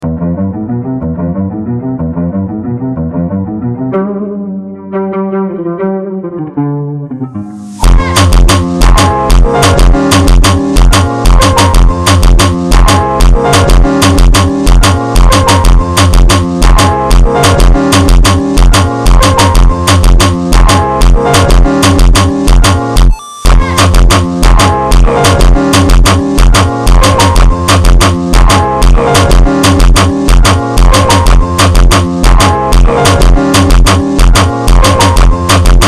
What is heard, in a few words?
FUNK Slowed